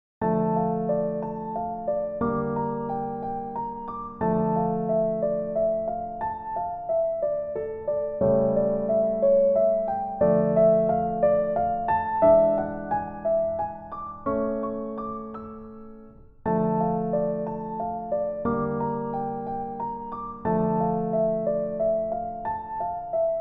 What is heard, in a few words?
Piano Solo Etude